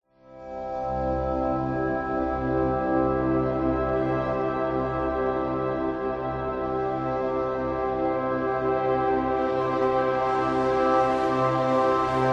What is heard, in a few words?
sound design cinematic musical swell glorious magnificant bright warm